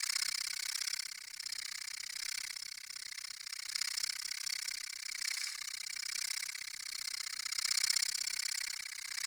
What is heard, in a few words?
Axe Projectile Spin Loop; Warframe; Weapons; Tenno; Sundial